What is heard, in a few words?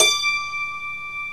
DULCIMR KOTO; OMI - Universe of Sounds